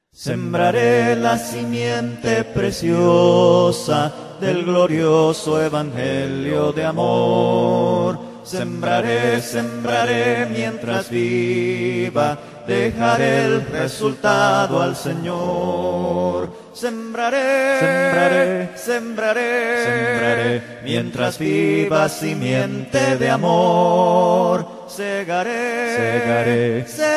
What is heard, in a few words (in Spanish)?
Himno Cristianos Sembrare la simiente preciosa; adoracion